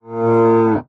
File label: cow random; animalia